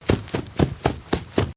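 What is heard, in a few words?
footsteps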